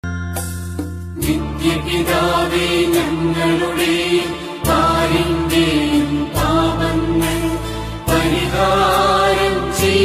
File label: malayalam christian devotional song